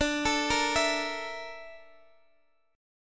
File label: A sharp Major 7th Flat 5th D